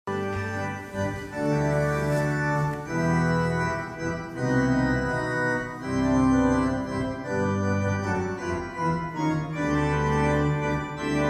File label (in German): Orgelnachspiel